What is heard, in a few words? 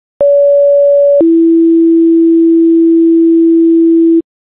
page, tones